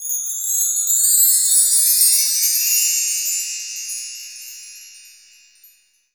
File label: BELL TREE; Greytsounds VOL-1; ORCH PERC 4